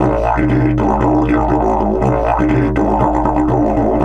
120, Didgeridoo

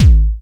BD, Drums Dance & Groove

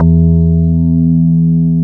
WOOD BAS; Synclavier Sampler Library; KEYS AND GUITARS

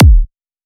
Hardcore Kick Attempt

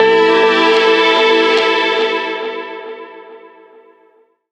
RAW STRING FX; Rawcutz; Nu Forms

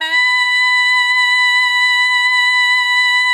B5, alto sax; Xsample VOL-2

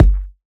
Kick, Dance Drum Kit

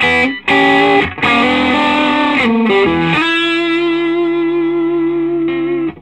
SOLO E, Vintage Blues Guitar

